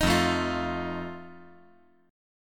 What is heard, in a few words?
F 7th F down